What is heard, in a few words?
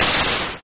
Vibrato ish explosion